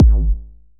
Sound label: verby bass drum A key, kick single shot tuned to the A note